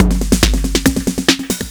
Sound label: Tom Pattern, drums